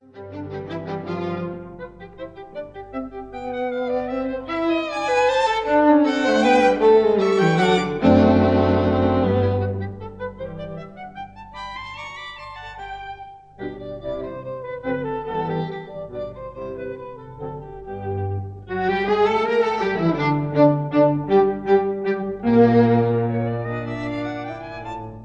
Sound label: String Quartet; Mozart String Quartet No 19 in C K. 465 3rd movement